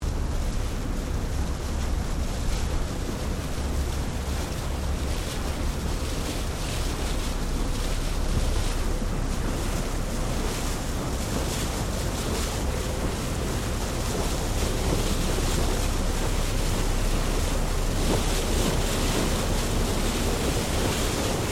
Boat Ferry Freshwater Class Sydney Bow Wake Accellerating Stereo, Manly Ferry; Boats; Vehicles